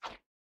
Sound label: Swing Tiny